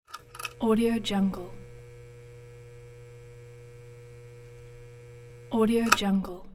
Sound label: neon light flicker